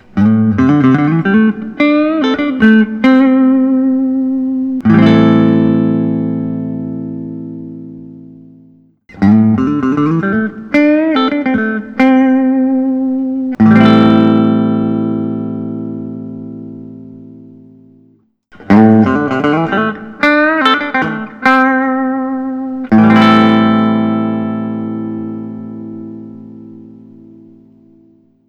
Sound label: elite honeyburst; cp thornton